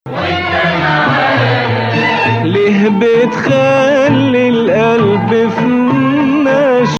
Nawa Bayati

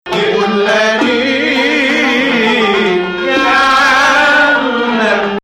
Rast, Arabic music

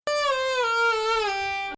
Bayati Nahawand, Maqam Bayati; Nahawand 4

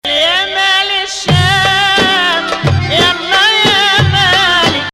Rast Ya Maal 2nd Rast; Maqam Rast

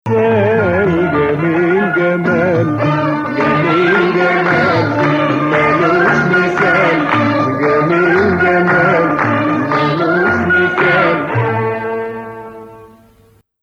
Nakriz; Nawa Athar; Nakriz 1

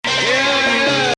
Bayati Jiharkah

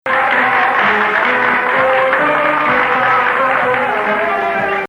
Hijaz

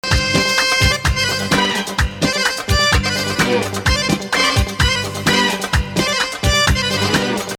Hijaz

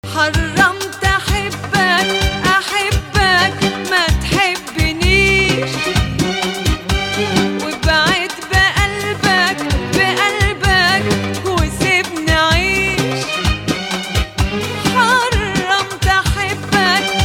Hijazkar Octave; scale degrees 6 & 7 different than Hijaz; Hijazkar 8

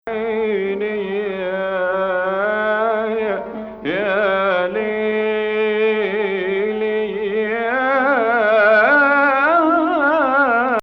Hijaz Octave; Hijaz 8; incl 6 & 7 under 8ve tonic

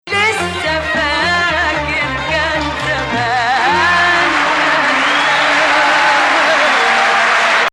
Ajam

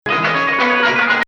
Bayati Nahawand; repeating the last few notes of #02; descent from 6

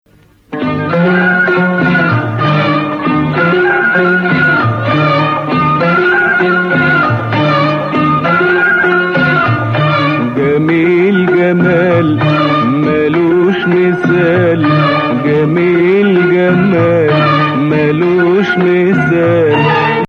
Nahawand

